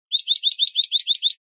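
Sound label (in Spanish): bibleos simples, canario; campero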